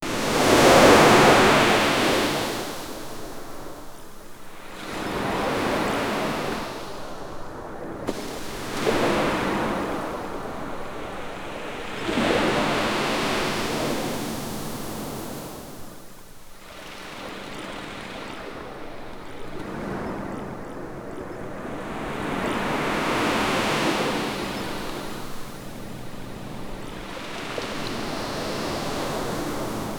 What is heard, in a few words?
foreground gentle ocean s, beach